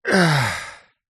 male voice groan relief after lifting heavy weight; zapsplat